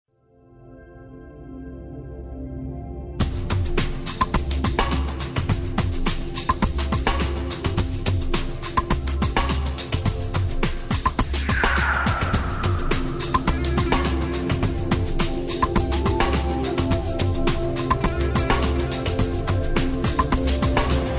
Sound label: newage